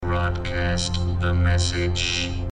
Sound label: Message